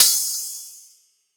Hihat Open

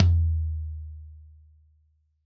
Tom Low-Mid Gen Purpose, Drums

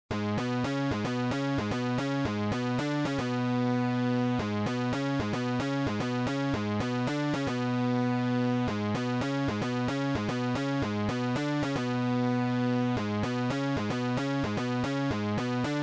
VocodeSynth; Ground Up Ginger

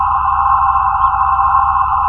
hoverpack engine; There; ThereClient

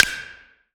Clap Snap Fings Inna Big Room, Drums; One Shots; Ableton Live 12 Lite; Core Library

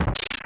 destroy breakable object; Open Zelda; Quest Designer 2.0.4b; Objects

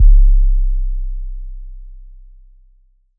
Kick Sub 808 Long C1

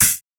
Hihat Closed Felt

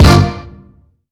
Stab Vinyl Punchy; One Shots; Ableton Live 12 Lite; Core Library

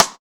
Rim; Drums; Ableton Live 12 Lite Core Library

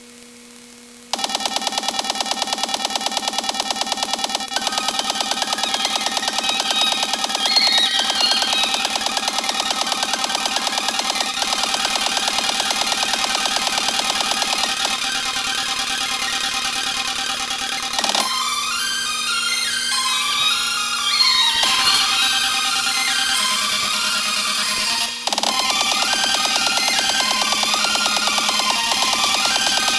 techno

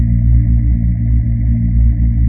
hoverboat ambient; There; ThereClient

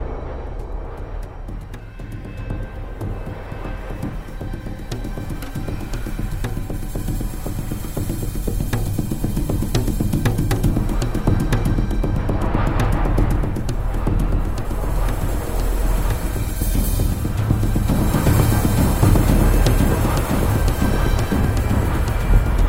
score Desert STORM; Journey

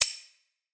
hover, menu

